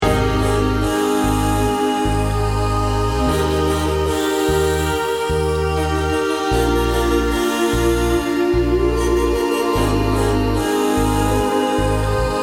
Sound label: Healing Melody